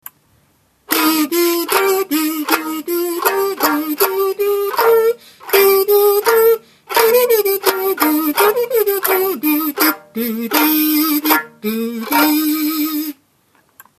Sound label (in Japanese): wagomu guitar